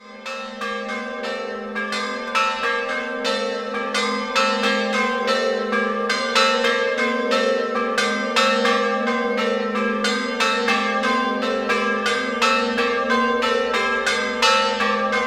Campone, campane

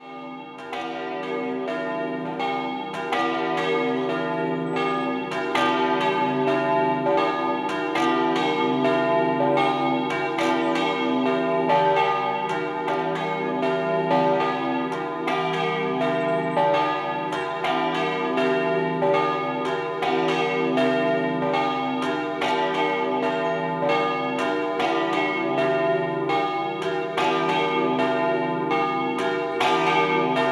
Trasaghis, campane

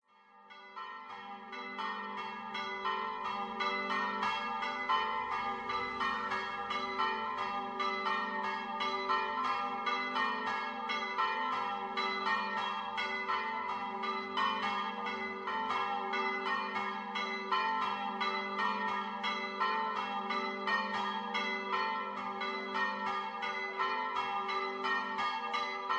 CORTALE DI REANA, campane

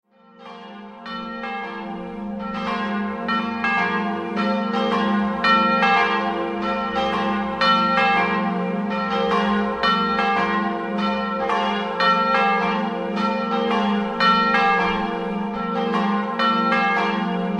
MEDEUZZA, campane

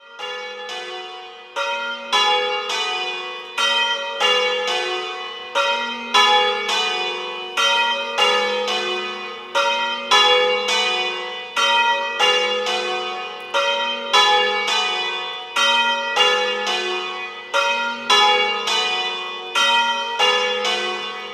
Villanova Di Prata, campane

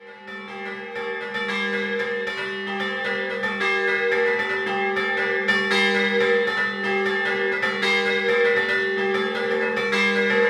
Montenars San Giorgio Scampanottata, campane

